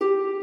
harp

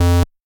bass

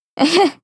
Heh（笑）; Marisa

